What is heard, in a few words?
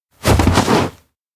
fall; koyomi; anemoi